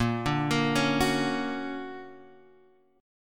D Augmented Major 7th Bb, guitar

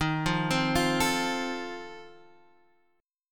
F Major, guitar chord; F/D# arpeggiated

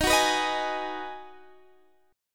Ab Minor 7th

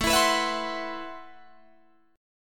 A Minor 6th Add 9th, Guitar Open D

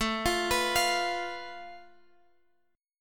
A Suspended 2nd Sharp 5th, Guitar, Open G

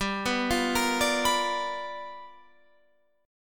G 7th Sharp 9th G chord, Guitar Open F; G7#9 arpeggiated

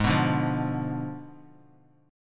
Ab Diminished 7th Ab, Bass Standard; Abdim7 strummed; JGuitar

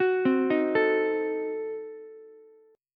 A Diminished 7th A down Ukulele; Adim7 arpeggiated; JGuitar

